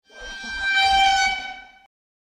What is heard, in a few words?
waterphone; made with Voicemod